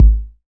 warm sounding bass drum sample g sharp key

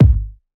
nice bass drum single hit g sharp key

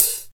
rich top end closed high hat sound c key; hat samples

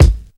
bumpin kick drum sample A key; kick drum samples

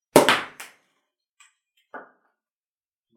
champagne pop cork; sound effects

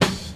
smooth acoustic snare b key; snare drum samples

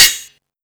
wet hihat sound C# key; hits one shots; hat samples; Duration 0:01; Samplerate 44100 Hz; C sharp

